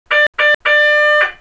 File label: Adjust; OpenBVE